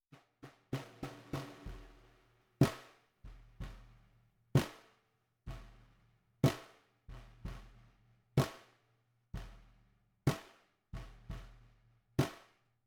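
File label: snare bottom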